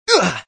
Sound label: Collision; Sonic.EXE